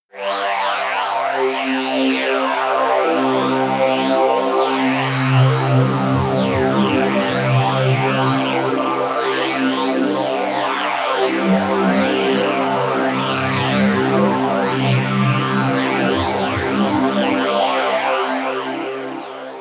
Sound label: pad sweep robot; flaechen